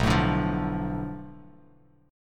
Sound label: B Minor 7th Flat 5th B Bass Standard, chord; Bm7b5; strummed; 7,12,12,10; JGuitar